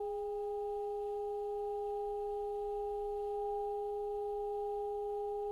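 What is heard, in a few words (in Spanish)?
Multiarmonicos, saxofón multifónico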